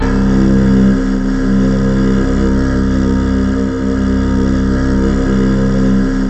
KW-Stackoid, Kurzweil; KW2000; Syntec; Wall of Sounds VOL-2; 90s sample CD

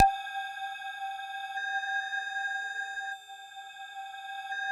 AQUAMARIMBA G4, Yamaha VP-1

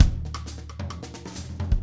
samba, drumscores; 90s sample CD

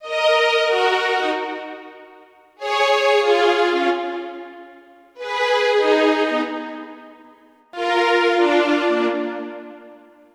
Strings; Sonic Foundry; Keyboards

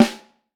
Snare Drum

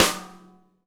WG SNARE; KITS-SMALL

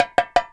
DARABKA, Mediteranean Traditions, PERCS

